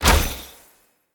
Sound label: Bow Ranged Shot; Weapons; Player; Bows; Grand Father Bow